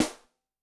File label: Maple, Snare; Yamaha DTXpress-II